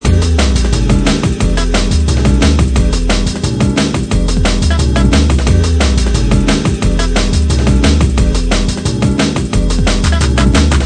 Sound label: Breaks